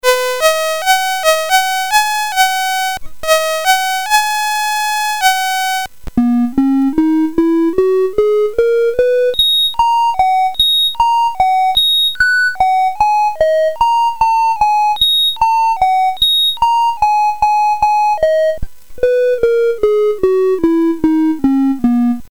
BASIC, CSG8580R5

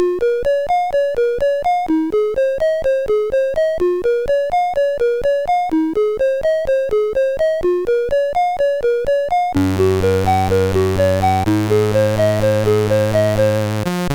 Instrumental, CSG8580R5

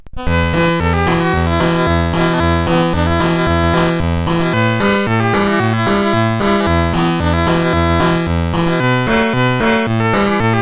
Trainer, CSG8580R5

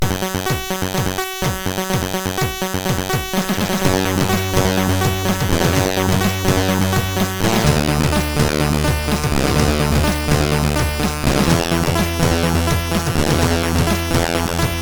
New Wave part 2, sid CSG8580R5

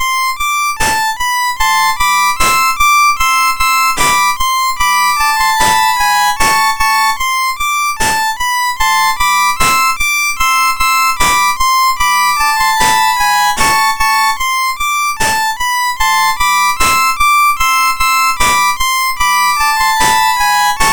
Courante, MOS6581R2

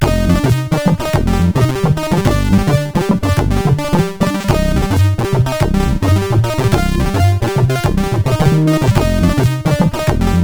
Reverb, sid CSG8580R5

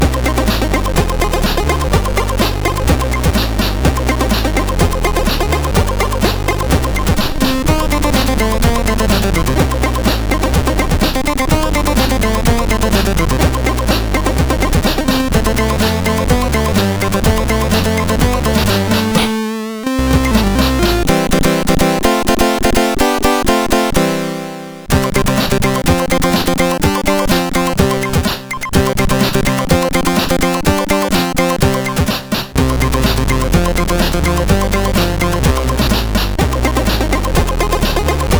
sid CSG8580

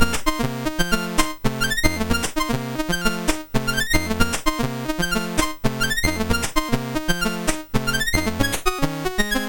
Juke Box, MOS 6581 R4

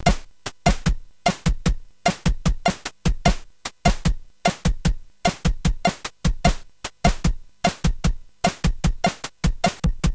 Little Bossa sid CSG8580R5; Henne; hvsc

